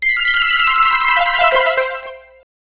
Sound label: level up